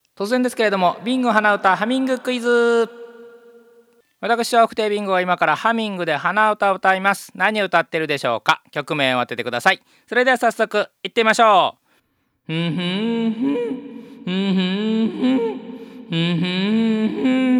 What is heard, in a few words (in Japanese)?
hanauta